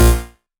Synth Shot; Vengeance Samples

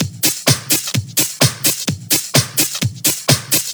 Minimal Loops

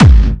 Bassdrums Clubby Kicks; Vengeance Samples

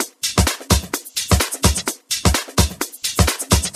Percussive Loops 128BPM; Vengeance Samples

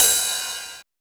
Ride, Cymbals; Vengeance Samples

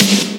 Snare; Vengeance Samples

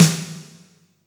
Claps & Snares

